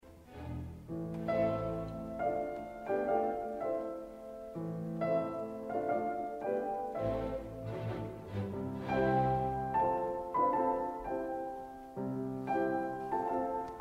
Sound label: Piano Concerto in A minor; Grieg; Op 16